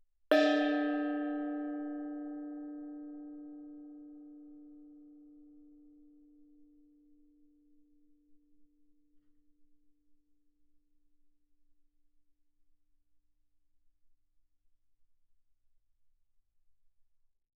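thai gong D4 fortissimo, Percussion gongs & tamtams; thaigongs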